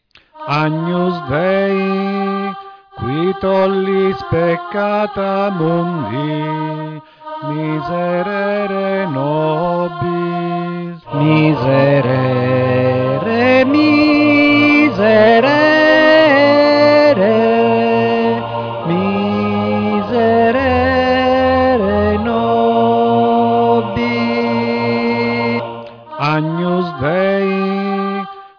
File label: Agnus Dei soprani solista